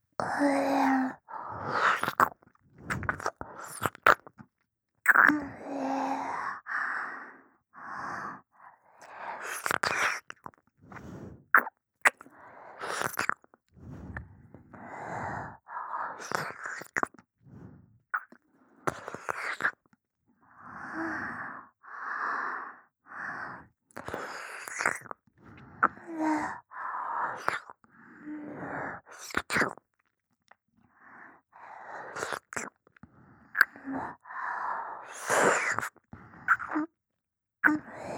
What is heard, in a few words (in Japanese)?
耳舐め, ASMR